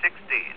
chime, sixteen

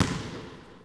Rockets Minimize; 250 Desktop Themes

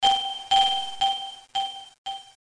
ALARM!

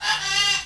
parrot; Jump Zampoli

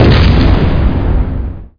satchel det; Spec Ops 2

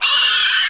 screec; alienbreed3d2

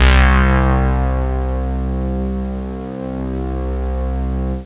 Bass & Lead C2; Star Trek JR Audio

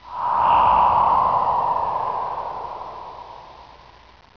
Creepy; WARLOCKSNDS

